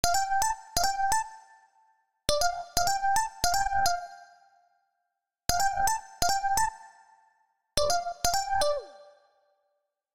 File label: Mallet Synth D Minor 88bpm; MIDI Clips; Melodic; Ableton; Factory Packs; Chop and Swing